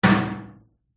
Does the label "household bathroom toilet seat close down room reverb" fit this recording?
Yes